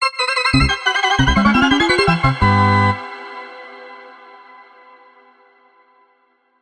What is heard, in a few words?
Battle Start; mega bomberman arranged by jattelo; bomberman 94 remake